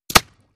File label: Bullet Impacts Water